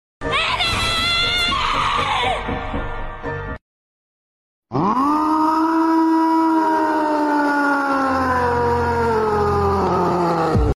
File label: Anime screams; فریاد های انیمه ای